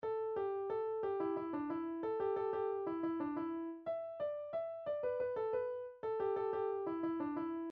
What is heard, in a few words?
blues in e minor, midi